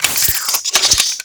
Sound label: Sidearm Equip; Warframe; Weapons; Tenno; Wraith